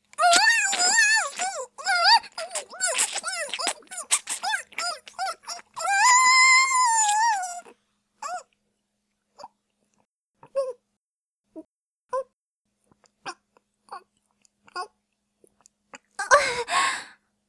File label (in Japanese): ごっくん; レイプ